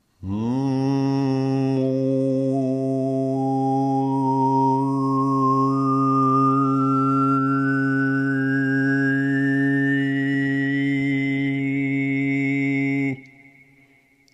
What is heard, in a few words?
sing harmonics